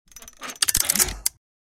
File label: Safe lock vault opening mechanism